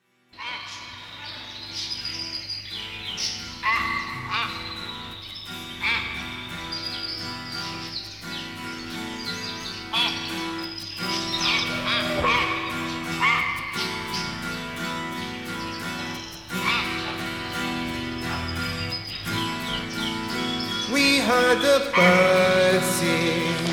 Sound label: Birds sing